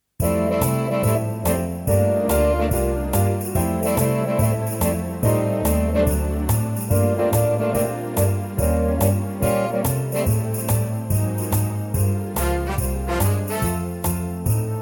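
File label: F live track, backing track; Livetrak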